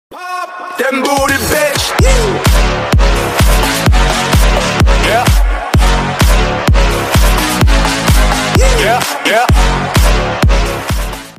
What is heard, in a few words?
music drop